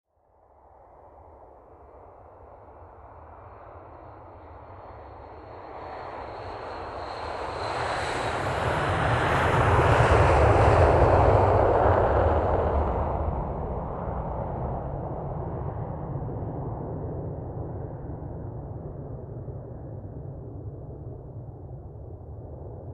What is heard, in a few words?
Aircraft on a runway flying by whirring